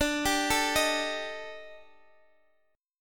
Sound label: D sharp Major 7th Flat 5th D, chord